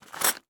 Sports Bag Plastic Bag Of Metal Stakes Picking Up On Dirt